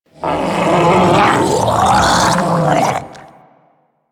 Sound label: Death, Infested; Warframe; Ancient Shell Agent